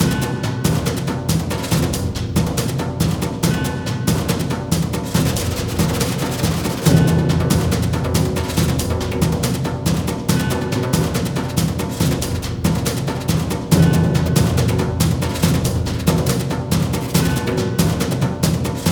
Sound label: Continous Loop; Valley